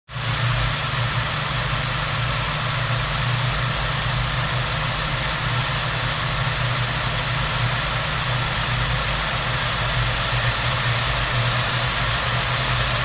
Gnat: Land / Interior; Ac Hiss And Engine Whine With Revs, Ignition Of Landing Jets, Idle And Rev Down. Close Perspective. Jet.